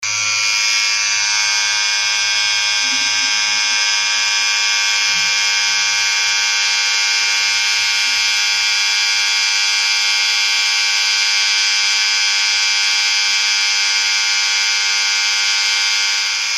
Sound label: shaving